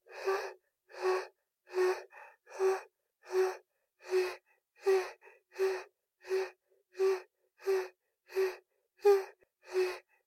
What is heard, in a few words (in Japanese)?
アッアッ ゆっくり